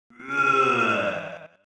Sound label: Groan